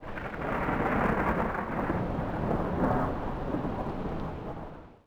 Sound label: beam chargeup